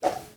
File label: Swoosh